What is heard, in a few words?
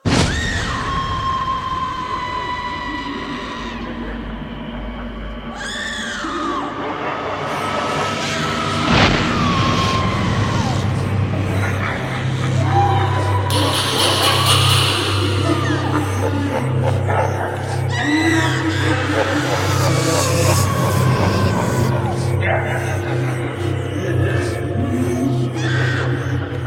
Scarry Awesome Ringt